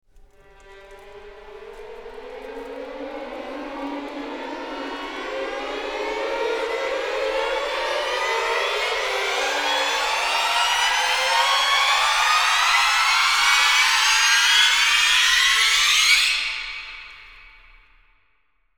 rise

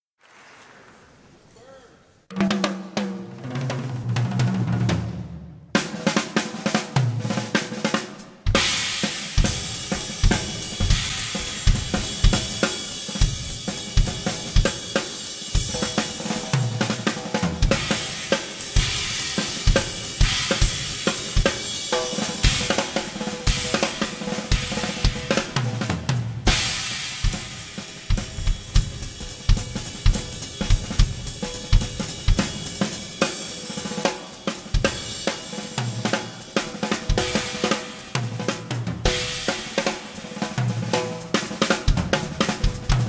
drum solo

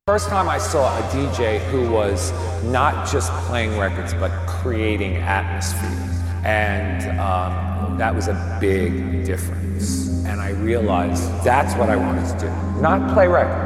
atmosphere interlude

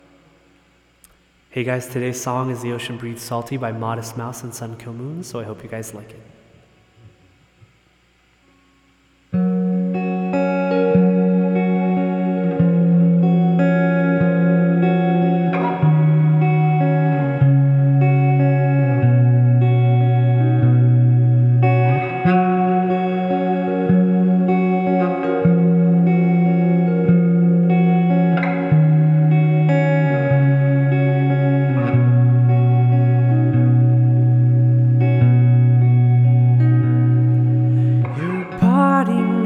ambient cover

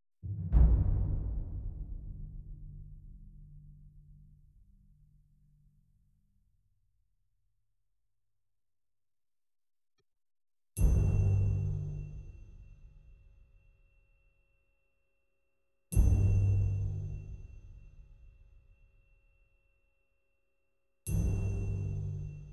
joy to the world percussion only

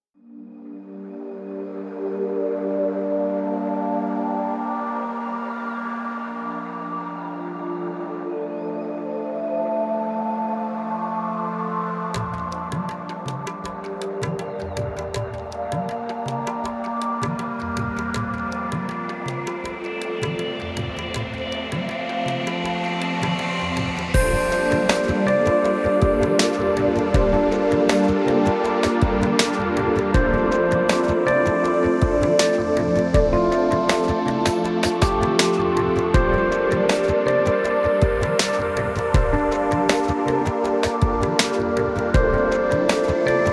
lounge soundscape mix

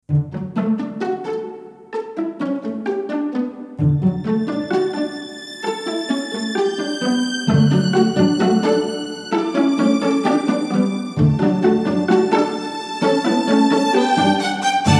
strings only

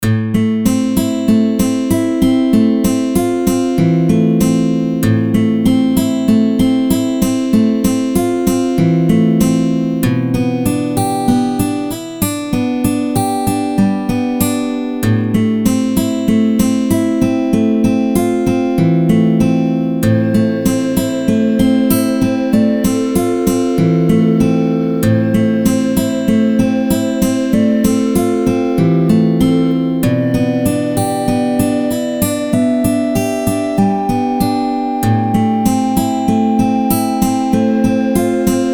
instrumental

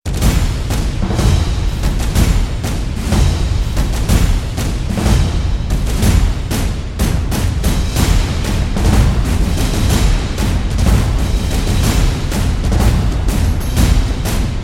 drums

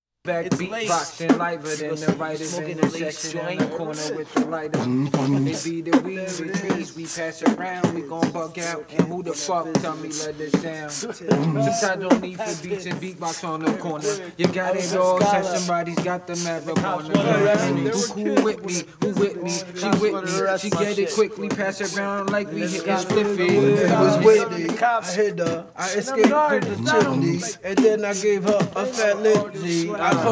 beatbox intro

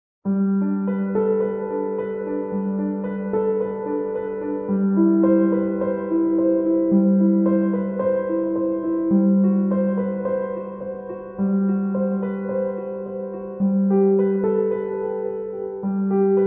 prelude arr for piano; johann sebastian bach; cello suite no 1 in g major bwv 1007

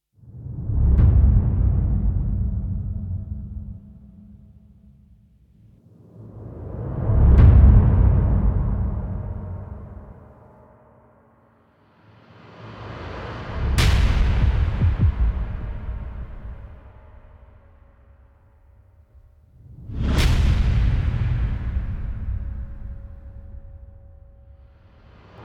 percussion only

